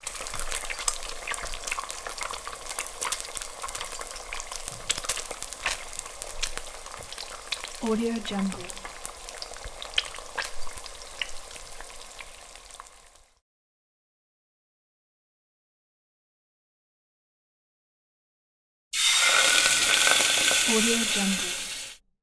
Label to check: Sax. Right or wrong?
Wrong